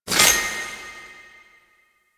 Crystal Pickup; UI; Pickups; Citrine; Warframe

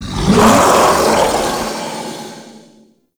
Male Vocal Attack; Enemy; Mockeries; Sub Boss; Mockery

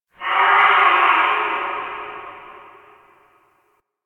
Sentient Lair Spirit Chatter, Ambience

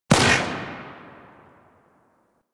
Rifle Shot Secondary C; Weapons; Warframe; Tenno; Bard Rifle

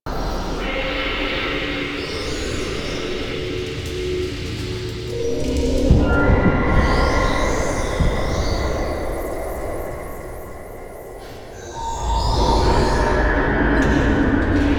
Rail Jack Ship Ambience Wraith Loop; Warframe

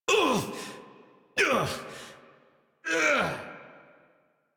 Struggle Long, Adult; Warframe; New War; Adult Operator

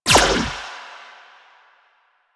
Choir Erupt Firing Eye; Warframe

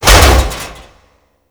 Frame Step; DE; Warframe; Vay Hek

